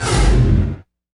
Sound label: IMP FLAME, Cinematic Impact